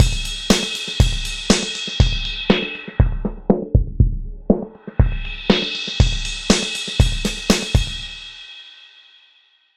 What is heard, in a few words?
Drum loop LPF sweep